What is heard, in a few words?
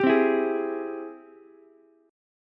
Ab Augmented Major 7th Ab Ukulele; strummed; JGuitar